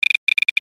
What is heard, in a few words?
Alarmlike Sequence, beeps & tones